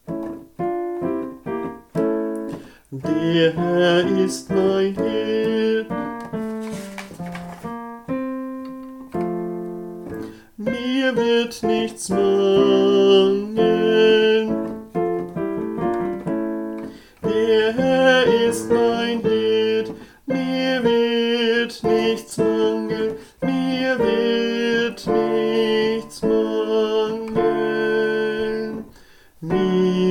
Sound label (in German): Sopran